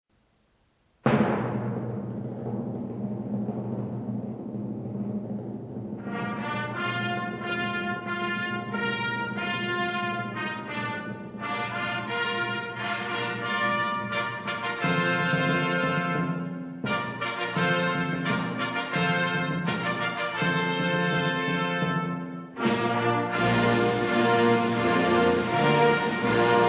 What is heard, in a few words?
fanfare